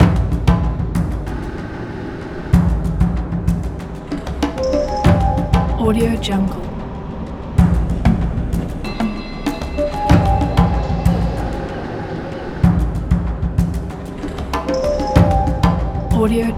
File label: tribe postnuclear drums game soundscape